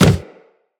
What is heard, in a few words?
Run Wood, Footsteps; Characters; Operator